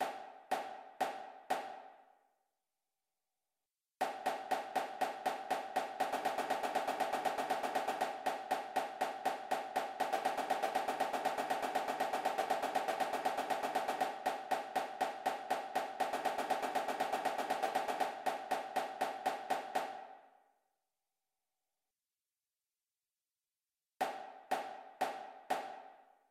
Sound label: Eighths Snare; Snare Eighths Variations; Drumline Warmups